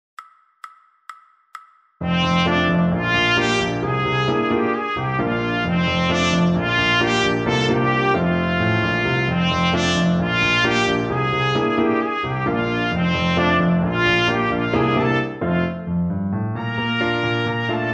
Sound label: trumpet